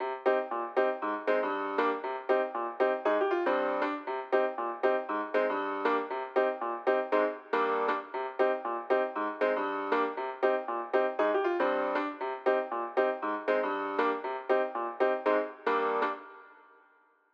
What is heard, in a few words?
electro swing piano